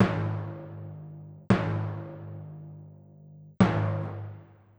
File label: Timpani, Orchestral; Sonic Foundry (Sony Creative Software); Orchestral VOL-2